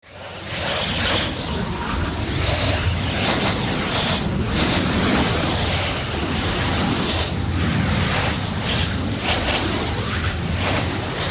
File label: tornado; Seer; GamigoNA